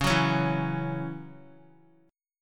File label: Db Minor Double Flat 5th Db Guitar; strummed; Dbmbb5; JGuitar